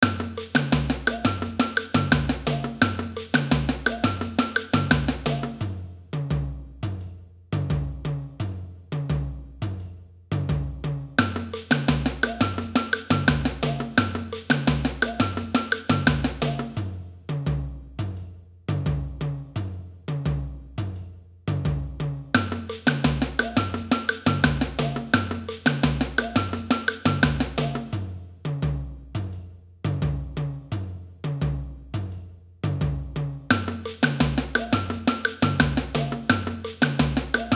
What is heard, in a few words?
surdo, samba; mambo